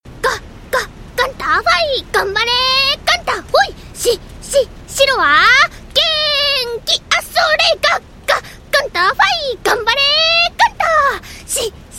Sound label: applause; osu!; Axarious x RafisADHD